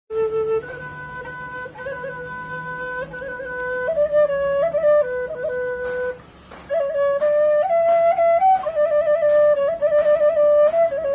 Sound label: Shvi